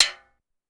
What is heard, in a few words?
Timbale Edge, Pitched Up; Clavia DDrum-AT